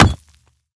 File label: shield clap; Smite; Cabrakan